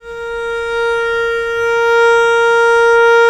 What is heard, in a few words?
A#3, VIOLE, Historical Instruments; USB Soundscan vol.23